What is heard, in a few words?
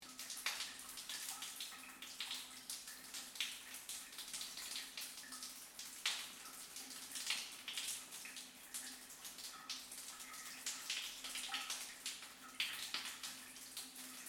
Water Drips Shower, Large, Tile, Loop; Water Sound Effects; Nature; BlastWave